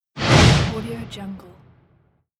whoosh; markoduca

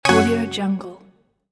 wrong

